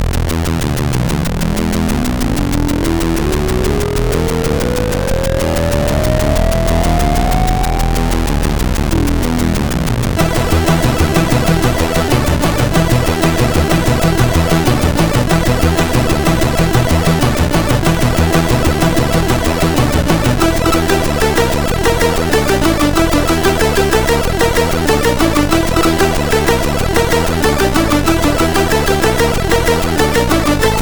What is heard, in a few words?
Spacey, sid CSG8580R5; hvsc